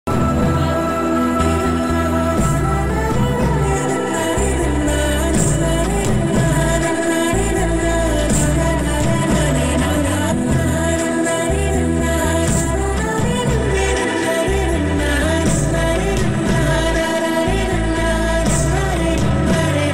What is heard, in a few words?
telugu movie bgm ringtones; sreekaram